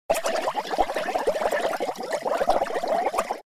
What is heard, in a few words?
Large air bubbles in water